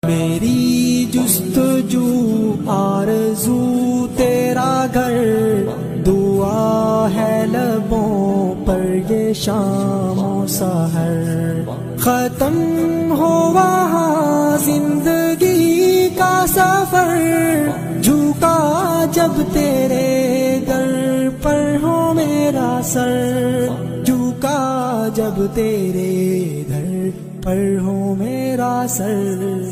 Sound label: Naat Ringtone